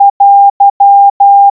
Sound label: wabun te